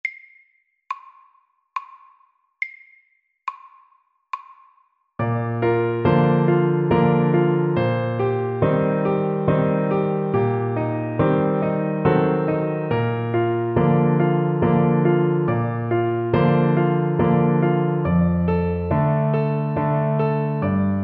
Vals SLOW

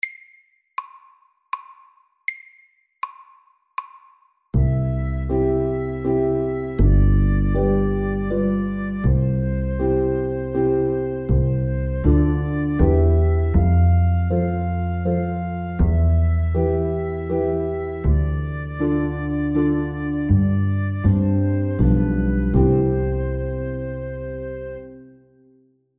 Sommervals SAKTE

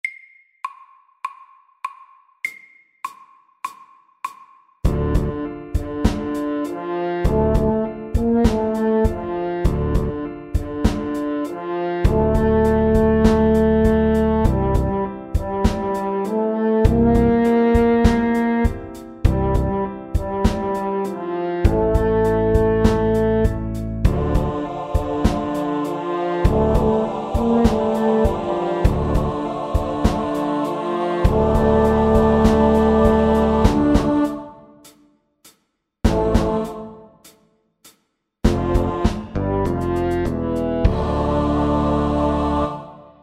A minor rap SAKTE

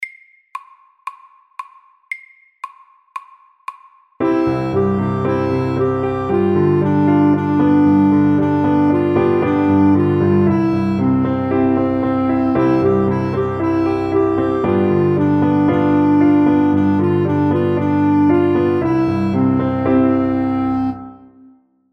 Walesisk melodi FAST 115

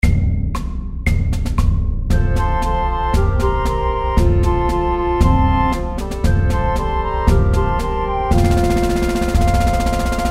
Aspirantmarsj

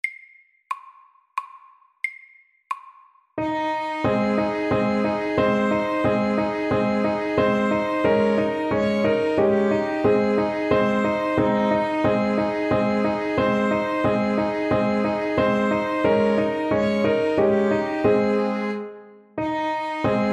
German dance SAKTE

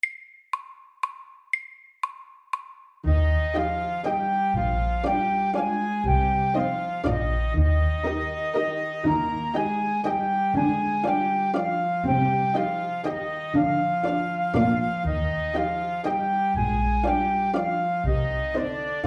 Legato vals FAST

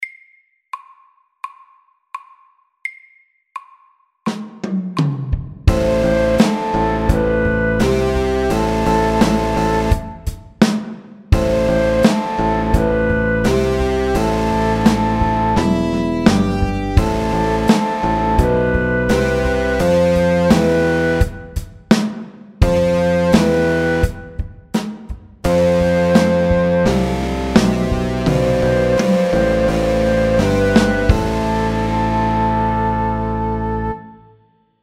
Metal rock SAKTE

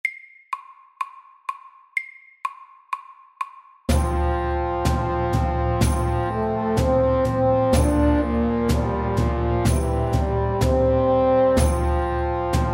Engelsk melodi FORT

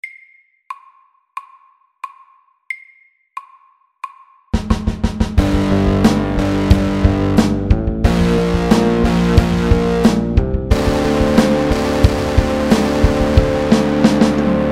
Pirate rock MIDDELS

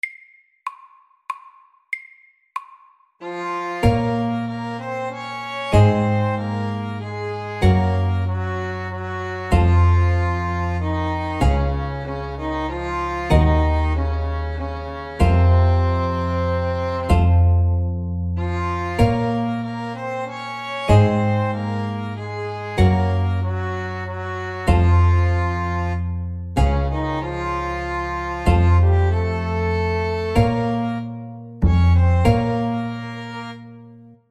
Folketone, 95

